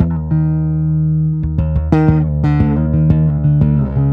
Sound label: Bass Chords Eb, D, Eb, D, Bb 117